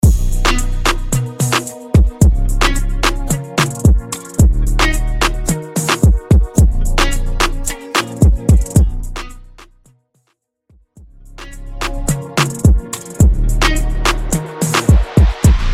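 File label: Extended Re Drum Hype Intro; 2020 Mainstream VIP; 109 Bpm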